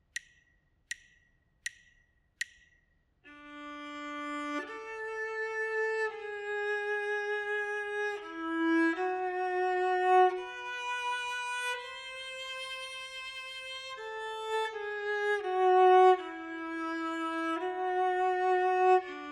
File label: melodic dictation; ear training